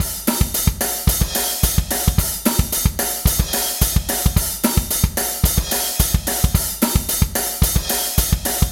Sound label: Metal